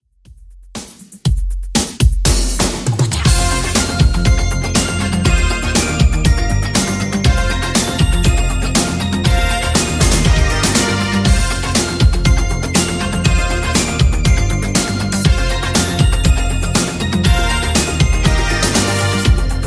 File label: 80s Redrum 120bpm